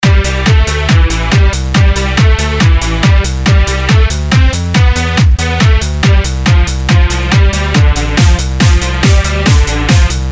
Techno Mix

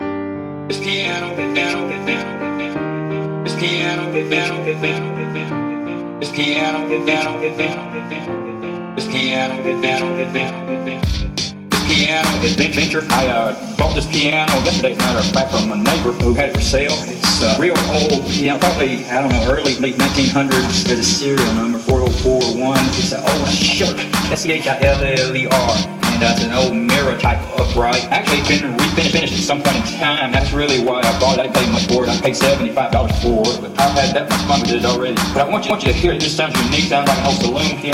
southern pianist

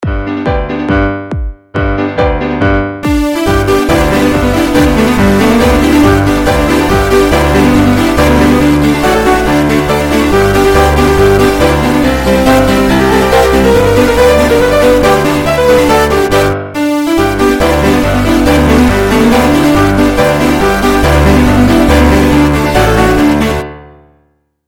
Techno

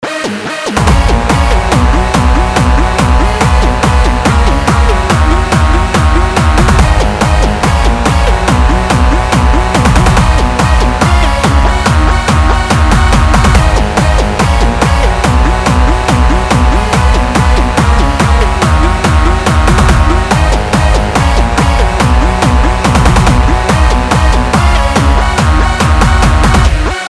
hardstyle dragons

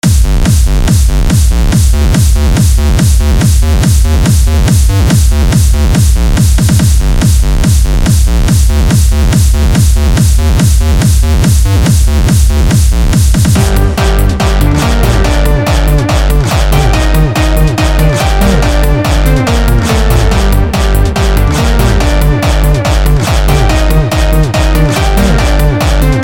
Hands Up! vs Hardstyle

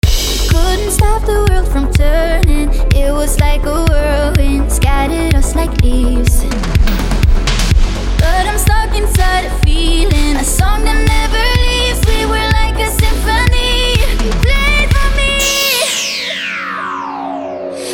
synthwave

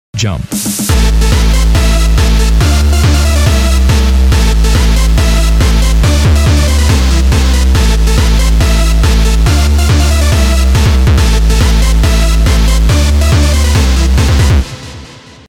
jumpstyle

